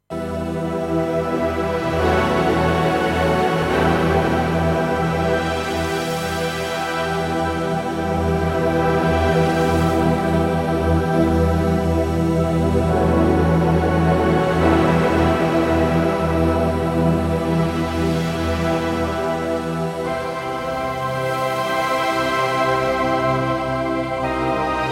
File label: Ocean Pad